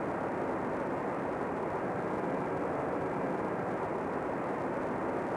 amb banquet Hall; Star Wars Obi Wan; XBOX

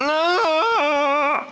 fall

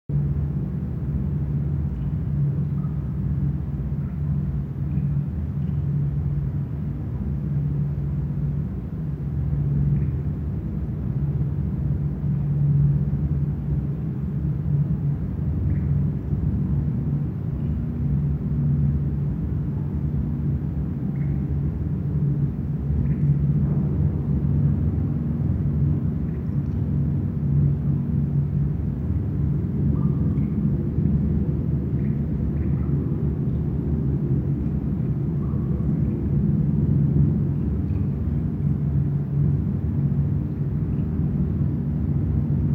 amb temple basement, music; The Da Vinci Code; XBOX

